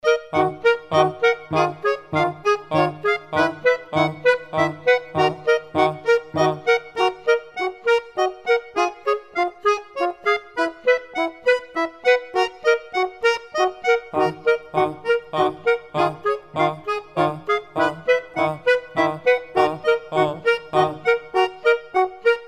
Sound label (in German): zwischenmusik